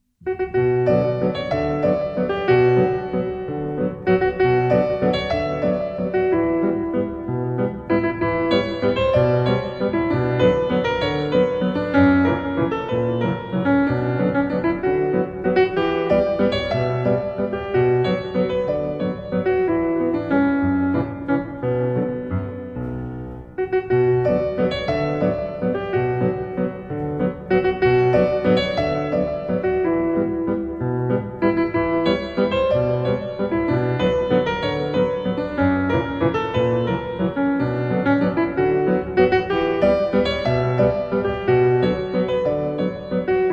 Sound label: Playful Dance